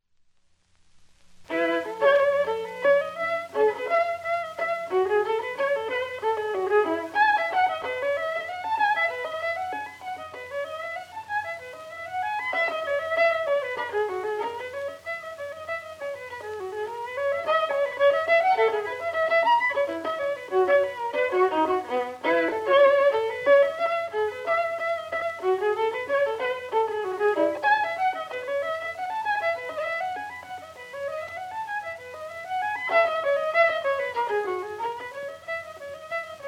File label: bourree; bach; bwv1006